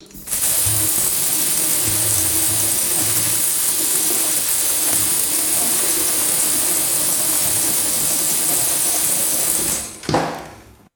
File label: steady shower water running and splashing